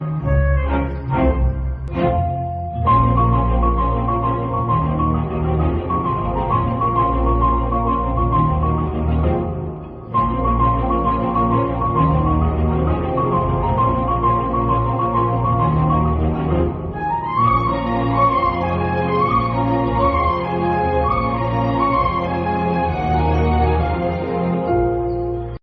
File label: Instrumental Bgm; Thiru